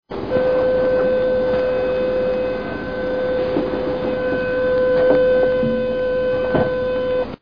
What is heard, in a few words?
door